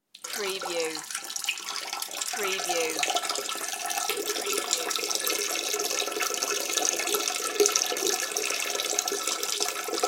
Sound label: Urinating